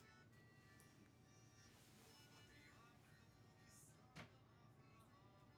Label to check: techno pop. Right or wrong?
Wrong